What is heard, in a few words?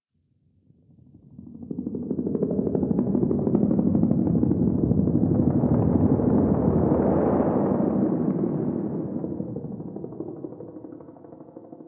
Distant Ambient Helicopter